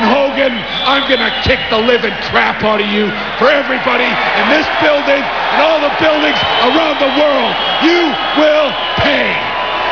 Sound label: kick